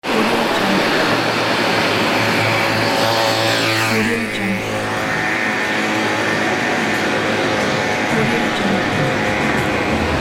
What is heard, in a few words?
street noise